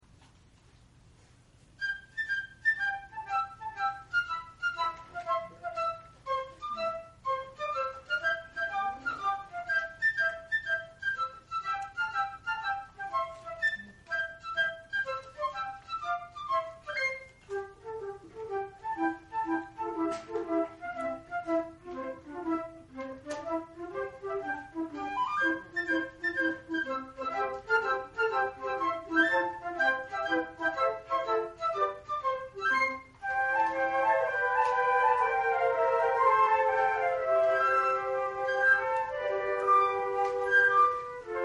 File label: new year consert, flute